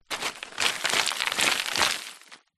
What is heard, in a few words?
footsteps x5 on large pile rocks stones collapse under feet; foley; zapsplat